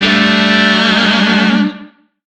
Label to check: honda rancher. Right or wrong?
Wrong